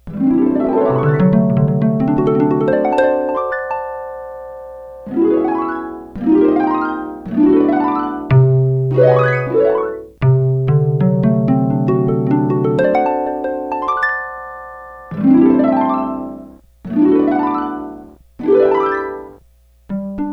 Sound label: harp